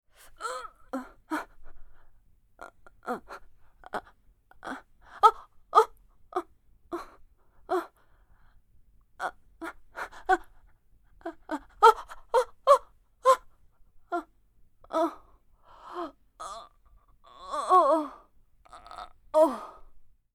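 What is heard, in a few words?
woman straining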